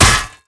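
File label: Damage Sword Plate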